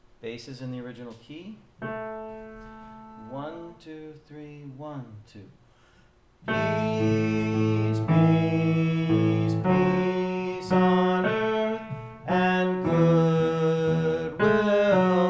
Peace, Peace (Bass in A), choir parts

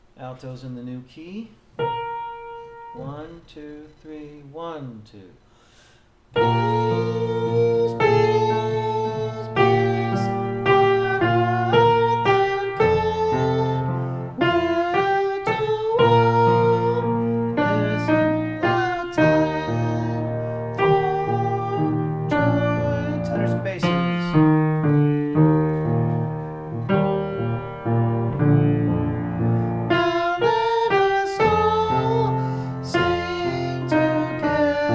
Alto in Bb, choir parts

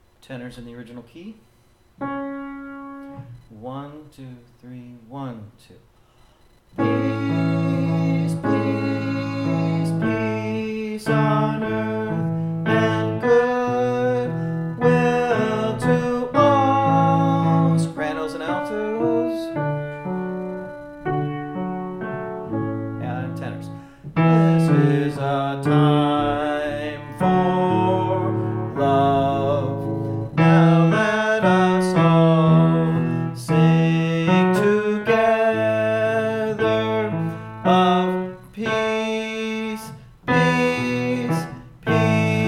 Tenor in A, choir parts

